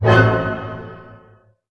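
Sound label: A diminished pianissimo, orchestra hits; Sound On Sound - Peter Siedleczek's Orchestra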